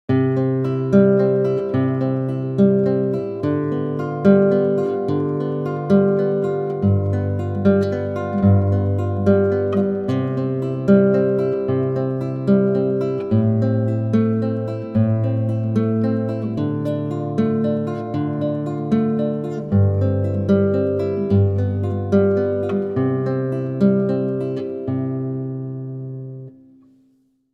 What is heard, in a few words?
arpeggio, guitar